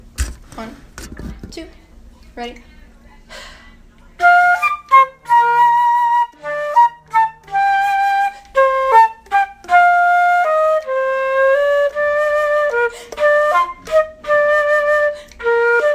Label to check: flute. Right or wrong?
Right